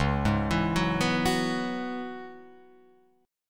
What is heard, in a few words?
Db Minor 11th Db Guitar Open F; Dbm11 arpeggiated; 1,3,4,1,2,1; JGuitar